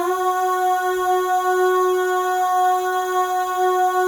AHS, FEM, Symphony of Voices; Spectrasonics; FEM.1 AHS

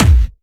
hard bass drumz

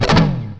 FX06, FX guitar; USB Soundscan vol.31; Miro s Grooves; AKAI

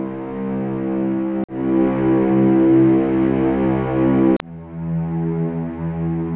cellos D 2, String Ensembles; Miroslav Vitous; 90 s Sample CDs

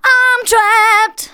VOCALS SUNG; Techno Trance Essentials

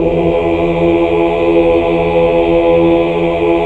HAL OOH OOOH Oct

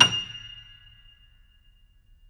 JAZZ F6, Keyboards Acoustic; USB Soundscan vol.17

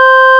ORGAN C5; Big Fish Audio; Synth City

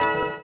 orient, bizarre guitar; Spectrasonics

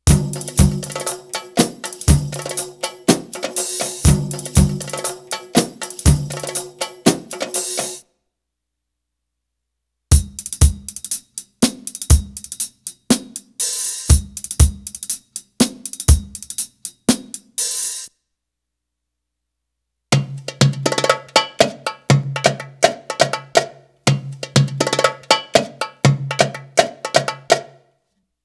Arabic Rhythms

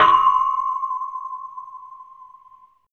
C, Pads; Zero-G; Total Drum Bass

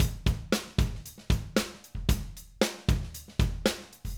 Acoustic Live Drum

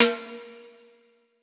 Hi Timbal, percussion reverb; MARKYDRUMS

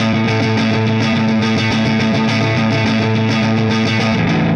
Clinical Distorted Funk Guitar; Sonic Foundry (Sony Creative Software); Indie Rock Anthems; Session 02 - Clinical

